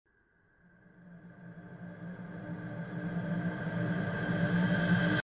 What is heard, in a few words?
intense; hl2dm; oppressive territory